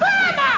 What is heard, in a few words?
attack; Lords of Conquest v1.0